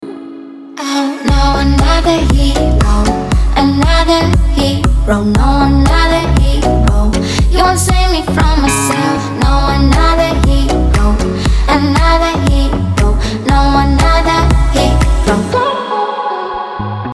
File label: hero song